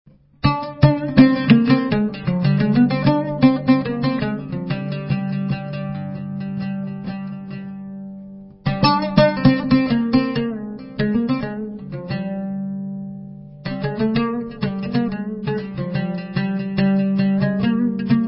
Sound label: ZAPIN Malay Traditional Song